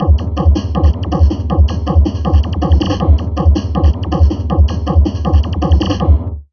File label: Drum Loops Crazy Processed; 160 CHAOT; USB Soundscan vol.07; AKAI